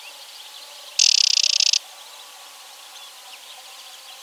fauvette a lunettes

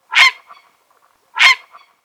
heron pourpre

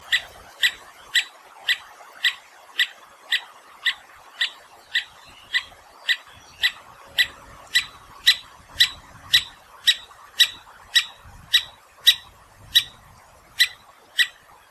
pic tridactyle; Chant saccadé profond : {Ex} (kwi)*; Cri : quasi-identique au chant

